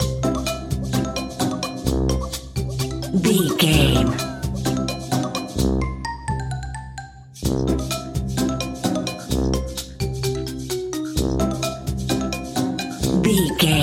wacky inventor loop

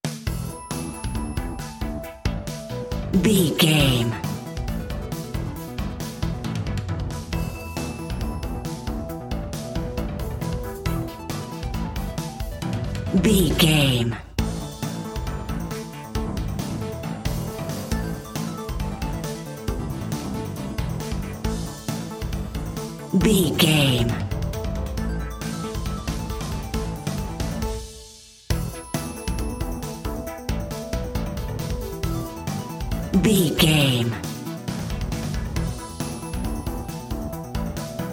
80s synth rock anthem no lead

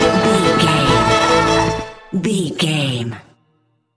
dark showdown stinger

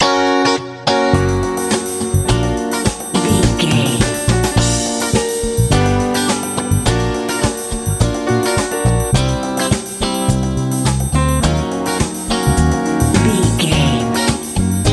rnb

